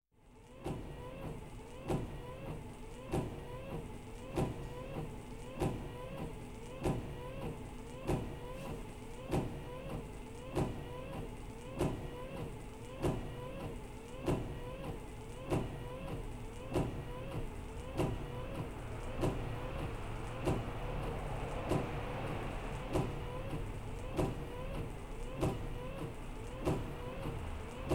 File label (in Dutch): Auto - Ruitenwissers; KMP Film Video Sound Effects